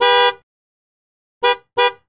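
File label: Truck Cherokee Horn; SFX Kit; Trucks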